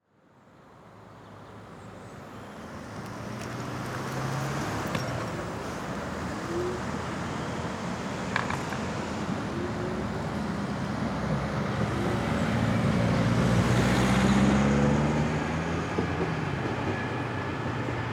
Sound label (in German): Ampel; CREUTZWALD